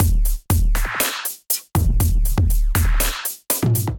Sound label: Kit, Drums, Hybrid; Wrong Sided Kit; Ableton; Factory Packs; Drum Essentials